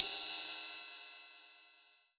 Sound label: Yamaha TG100 Ride Cymbal